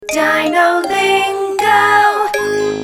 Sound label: JINGLE; DINOLINGO